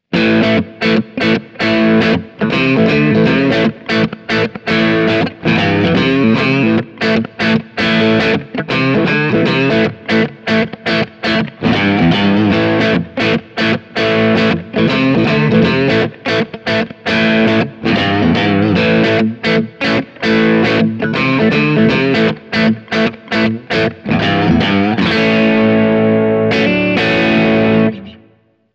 EMG T System Crunch Middle

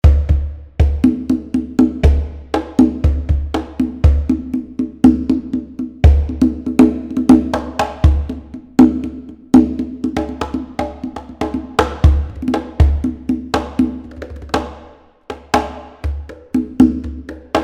Conga Set Grooves

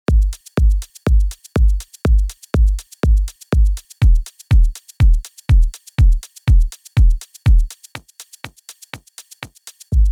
Techno Kick Sample Layering

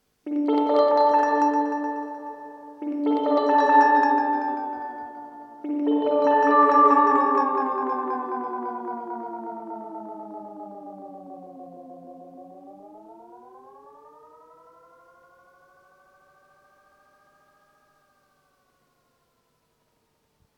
Echo Fix EF X2 Mode 2 var Speed

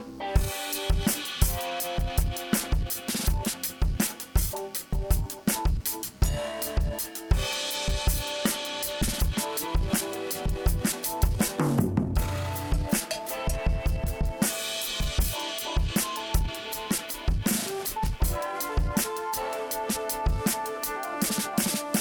Oversampled Drums NYC 0.20

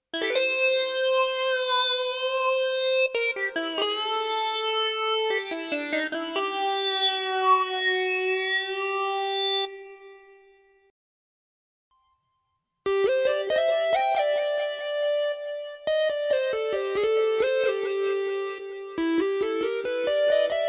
Synth

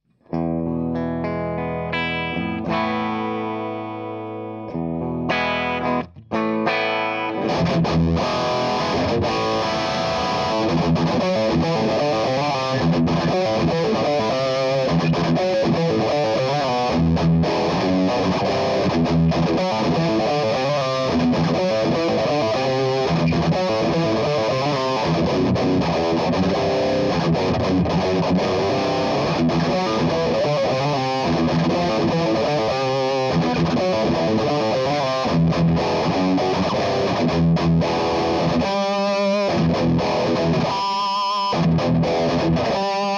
high gain

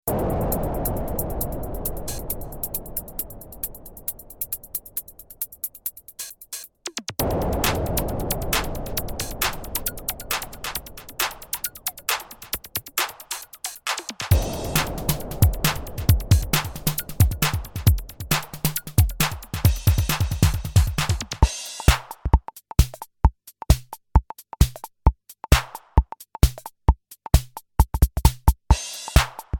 ER-1 Grooves

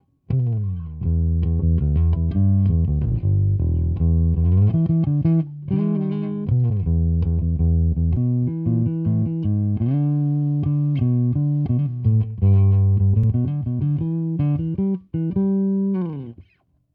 Preci neutral

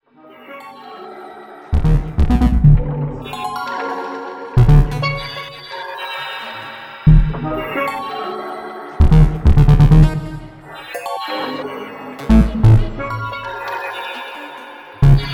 Multi MIDI Chaos; Future Retro Zillion

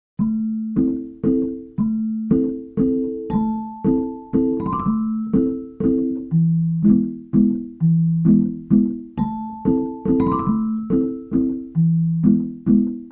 Dulcitone Celesta

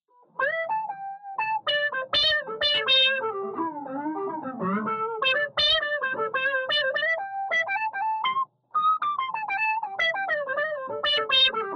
SQR LEAD OCT UP

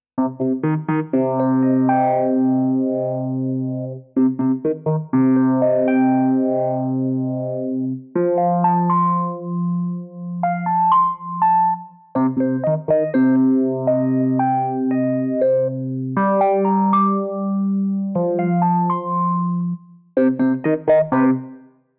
EP

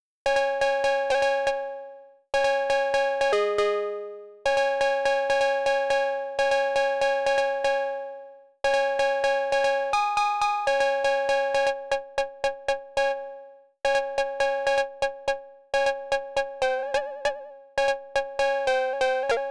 808 CB Sound

